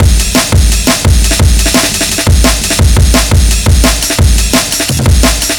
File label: b-complex amen, Amen Breaks